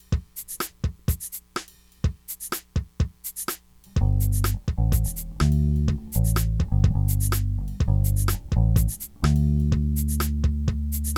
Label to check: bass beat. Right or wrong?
Right